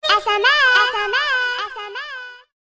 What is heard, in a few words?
Sms Funny